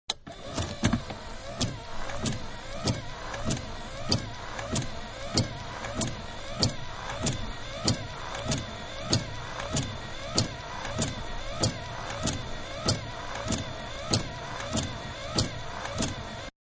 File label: Car Big-Windshield Wipers-Fast, Exterior; Cars (Sound Effects:Vehicles)